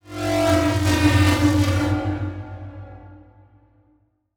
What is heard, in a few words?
Transition Whoosh Warble